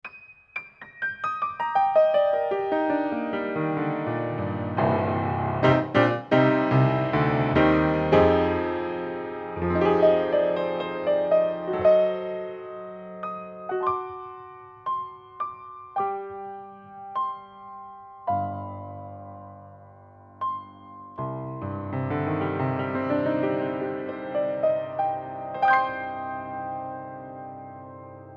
Piano, Inside Card Pair

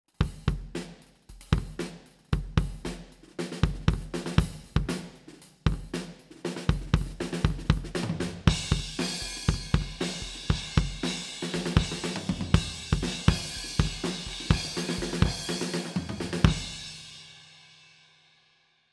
Kick, Head Off 20cm U47 FET Outside 90cm

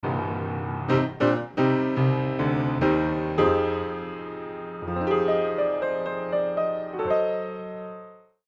Multimic Facets Piano XY Mix

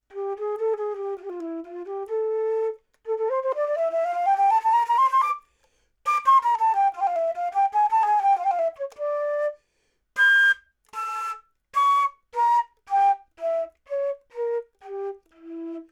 Flute, 40cm Low

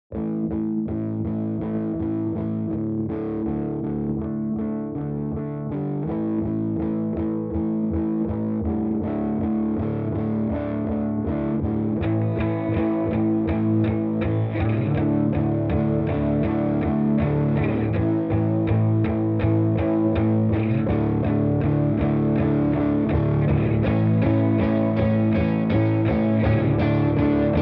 Gtr Build

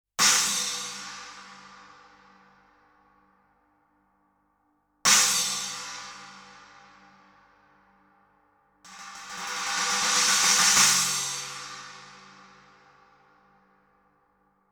Cymb China

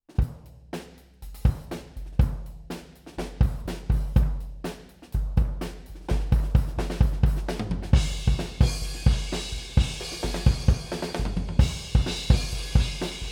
Kick, Head On 10cm Outside U47 FET Outside 30cm

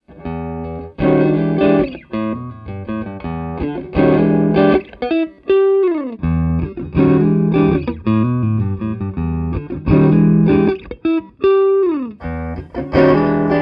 Phase EQ Combinations

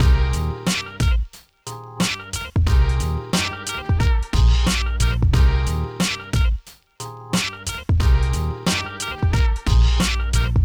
Drum Loop Invert; Mixing Secrets

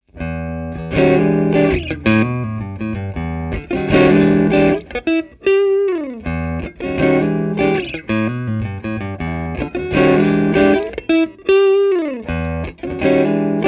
Multimic Blend Elec Gtr2 Mix D112 to C418

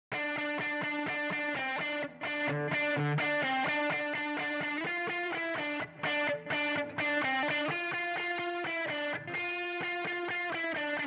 Gtr No Dist

